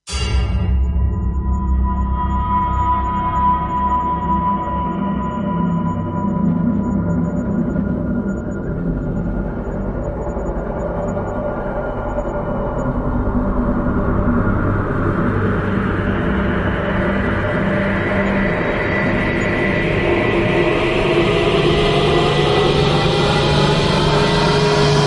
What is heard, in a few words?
Cinematic Tension; Gothic Storm Music; Brain Warp (Sound Design Trailer Tools)